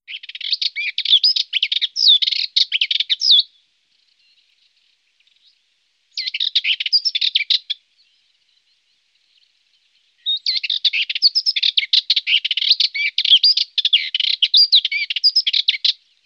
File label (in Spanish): curruca rabilarga